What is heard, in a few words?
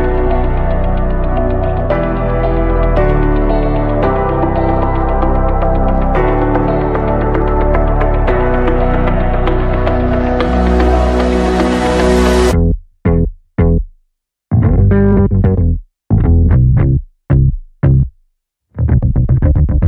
Official English Instrumental Ringtone